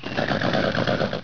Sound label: nicker